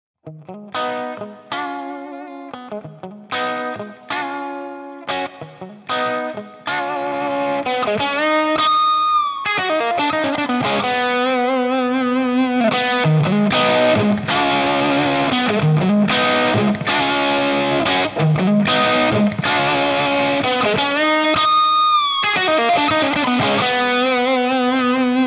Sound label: Rhythm Pushed; Mercury2CP